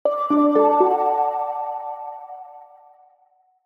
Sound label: The Jingle; American Arcadia Original Game Soundtrack